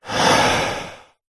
human breath in relaxed inhale; zapsplat

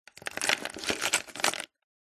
coffee pods in plastic tub rummage movements; nespresso; zapsplat

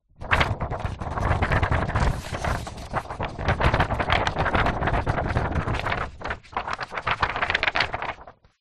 foley paper sheet thick movements shake; zapsplat

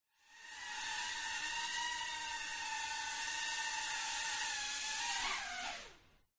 Drone; fox audio

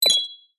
multimedia game tone metal chink collect action

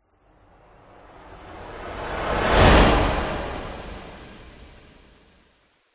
whoosh; orlando lanzini

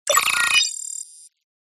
Open; PMSFX

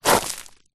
foley footstep shoe kick stones ground small; zapsplat

